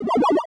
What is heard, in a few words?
wave, 8 bit